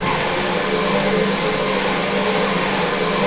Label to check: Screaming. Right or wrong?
Wrong